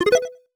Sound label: menu; Fur War; chisme